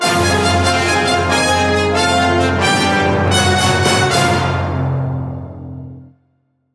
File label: Fanfare BPM96 F; 4/4; Brass + Percussion